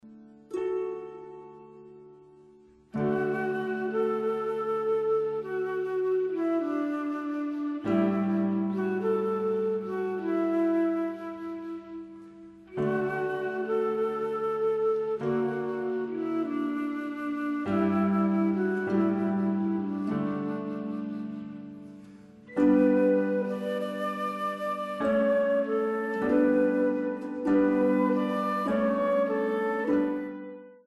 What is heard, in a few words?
Dvorak; CLASSICAL RELAXATION